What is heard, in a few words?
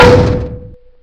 logo impact